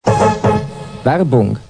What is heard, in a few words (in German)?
werbung intro